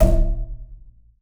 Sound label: BOW PERC, BASS FINGER; Xsample VOL-5